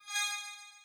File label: color choose pre select